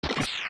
Steps Lava Land; zelda; OOT

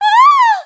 Scared; Hilda scared when the Sacred Slate explodes; zelda

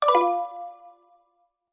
Prompt Cancel; Hit B to 'Cancel' in a prompt; Skyward Sword; zelda

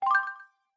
Textbox Question; Question appearing in a text box

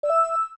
Main Menu Letter; Select a letter when entering your name

